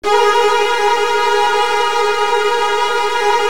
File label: TRILLS, Virtuoso Strings; ILIO; Kirk Hunter Virtuoso Strings VOL-2